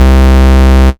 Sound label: Bass C, Offbeat Bass